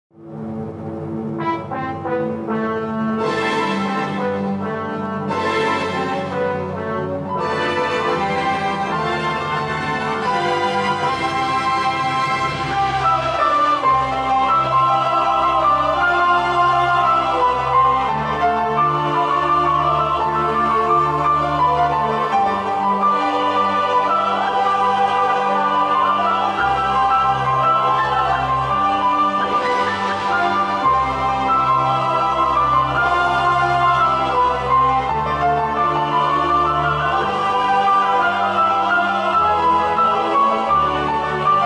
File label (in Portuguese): CONCERTO PARA PIANO